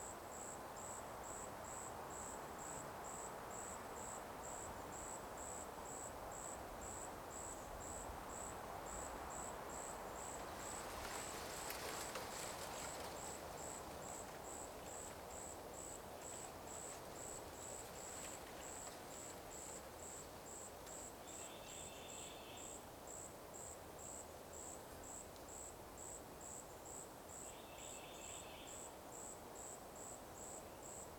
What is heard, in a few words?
Brown Noise Rain Forest Day Cicadas